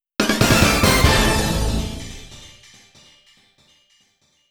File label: Quarter-hour Front logo only; WLS